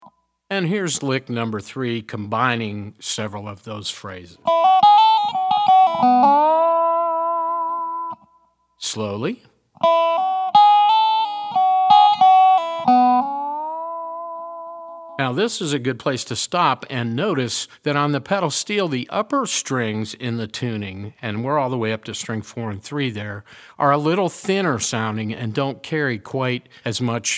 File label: resonator pedal, steel guitar